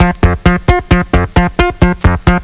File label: MELODY; psion cd vol1